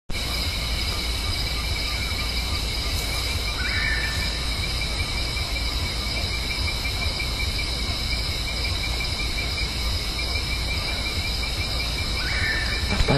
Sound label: Lurocalis semitorquatus; Karadya; Jorge La Grotteria